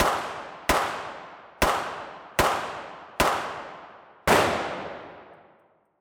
Ambidextrous Shooter .25 HandGun .357 Mag Gun Shot Fire; Nova Sound